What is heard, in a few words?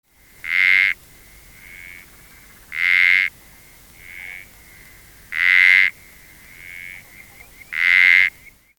martini, frogs, uperoleia